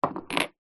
household aftershave or perfume glass bottles x2 slide into each other on wooden; zapsplat